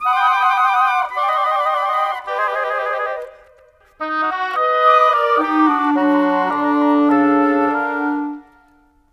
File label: Brass Winds; Sonic Foundry (Sony Creative Software); Orchestral Series VOL-3 - Cinematic